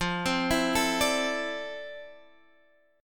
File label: F Major 13th, Guitar, Drop D; down; chord shape